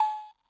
cowbell lo fi; Crowdsourced Sample Pack; TRUTH-HZ; Drum Kit; Percussion

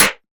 Snare; TRUTH-HZ; Crowdsourced Sample Pack; Drum Kit; Claps & Snares

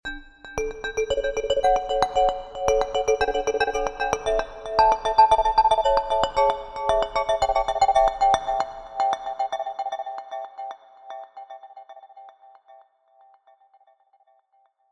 marimba echo melody; Mallets; Crowdsourced Sample Pack; TRUTH-HZ

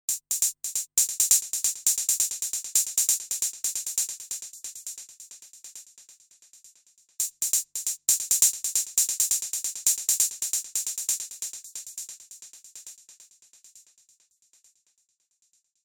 BR Hat Delay 135bpm, Drum Kit Hats; Crowdsourced Sample Pack; TRUTH-HZ

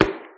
Floor Toms, Foley; Crowdsourced Sample Pack; TRUTH-HZ

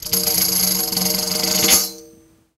Tambourin Roll; Drum Kit; Fills; Crowdsourced Sample Pack

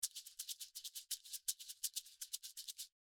tremoloed maracas; Crowdsourced Sample Pack; TRUTH-HZ; Drum Kit; Percussion

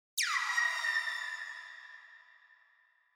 Lazer, Digital FX; TRUTH-HZ; Crowdsourced Sample Pack

